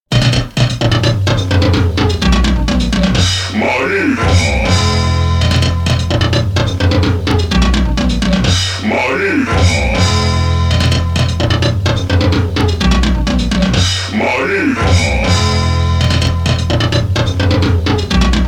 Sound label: drums